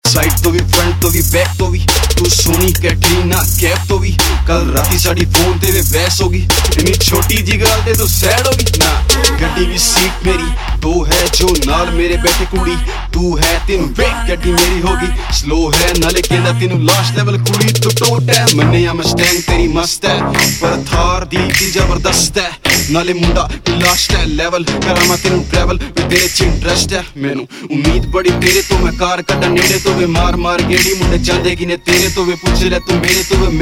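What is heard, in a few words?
Intro Ringtone, Punjabi Pop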